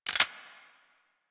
Hand Shotgun Fire Switch

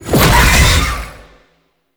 Infested Shotgun Teleport; Weapons

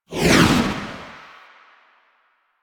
Motorcycle Passby Whoosh Medium; Motorcycle Ambience; Vehicles

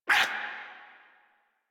Attack Effort; Grineer Jester

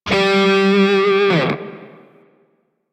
Grineer Shawzin Flourish Oct Two G; Music; DE; Warframe

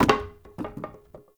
Wood Boarded Wall Medium Destroy Chunk; Objects; Destructible Barriers

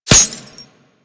Helmet Impact; Warframe

